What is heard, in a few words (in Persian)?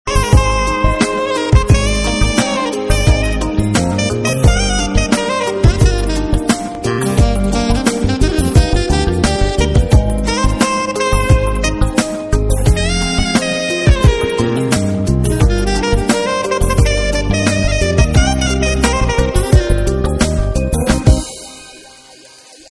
Ringtone Bikalam